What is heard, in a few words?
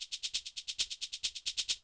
shaker; The Loops; Hats shakes bells